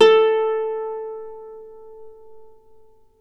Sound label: Celtic Harp, Plucked Strings